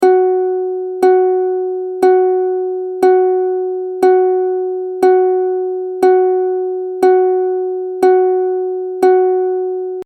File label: ADFB F, uke